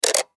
Button Switch; Multimedia Web Interactive; Buttons (Sound Effects:Multimedia

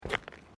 Footsteps Boots Ceramic Paving Tiles Step; Footstep Foley; Sound Effects:Human

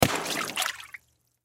Water Splash Ball Drop In Pool Toy; Backgrounds; Blastwave

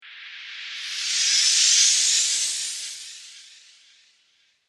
Teleport, science fiction

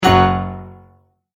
Multimedia Tone-Stop

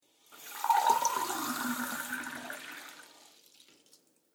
Water Fill Glass From Tap, kitchen

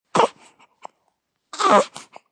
Baby Fussing, Newborn baby sound.; Babies; Voice

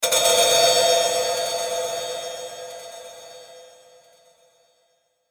Metallic Clank Delay; Metallic Sound; Metallic Sound Sound Effects; Production Element Imaging Element Accent Transition

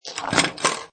Pinball Sound Ball Drops Into Launch Slot; Video Games

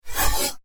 Alien Robot Movement; Robot Sound Effects; science fiction sound effect; Andrew Fleming

